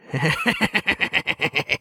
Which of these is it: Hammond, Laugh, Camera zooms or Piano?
Laugh